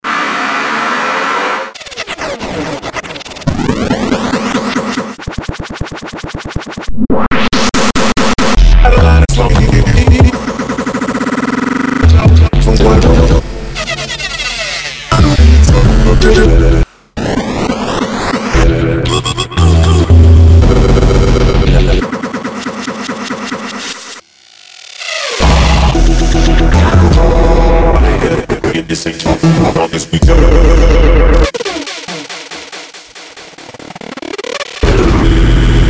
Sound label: dubstep sfx